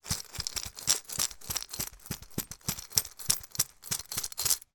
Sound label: piggy bank walk across floor; foley